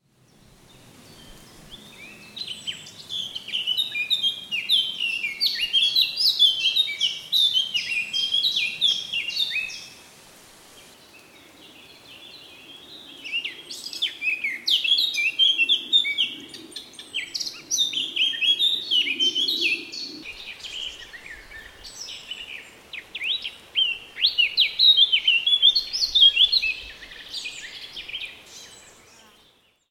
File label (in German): moenchsgrasmuecke, Vogelstimmen; Hahnenkamm erlebnispfad